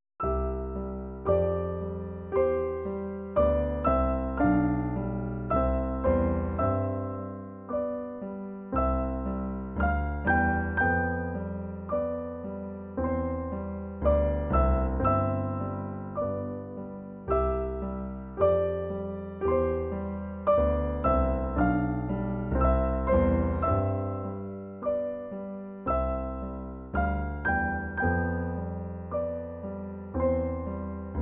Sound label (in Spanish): Viejo Carillon